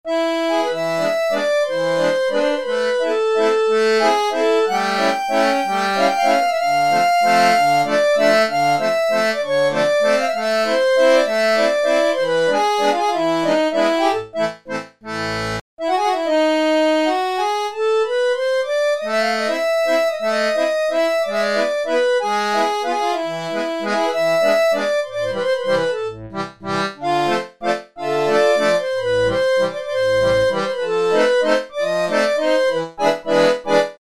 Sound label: Accordion; Guess the synthesizer game